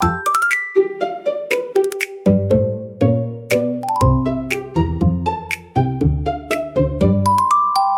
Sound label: loop